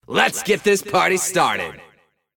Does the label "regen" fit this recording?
Yes